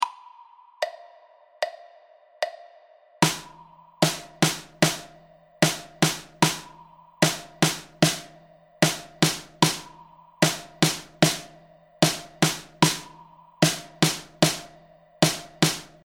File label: stroke, drum